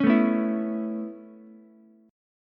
C sharp Minor 7th Sharp 5th C sharp Ukulele; C#m7#5; strummed; JGuitar

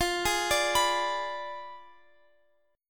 F Diminished 7th F down Guitar Open F; arpeggiated

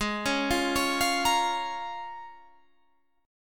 A sharp Minor 7th, G sharp

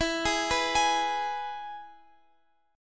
E Major guitar chord, Open F; arpeggiated; JGuitar

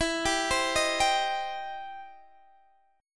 E Minor Major 7th Sharp 5th, Guitar, Open D; x,19,17,18,18,17; down

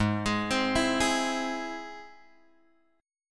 G sharp Augmented G sharp, guitar; G#+ Chord {4 X 6 5 5 4} arpeggiated

